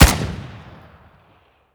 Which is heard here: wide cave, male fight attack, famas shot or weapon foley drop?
famas shot